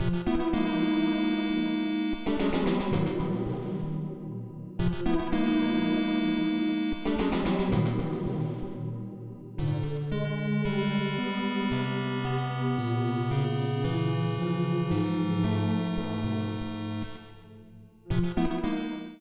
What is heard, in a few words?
Castle Flip; SMB3